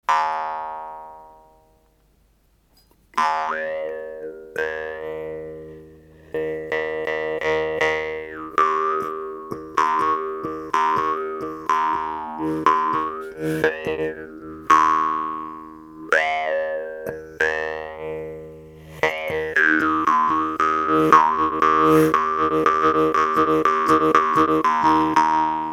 trad xl, murchunga

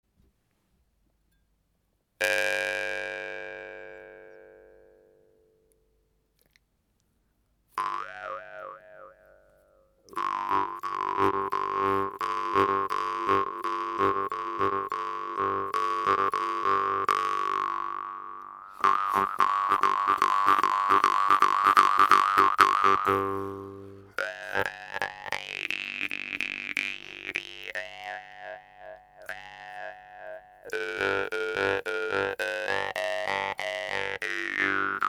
BASS

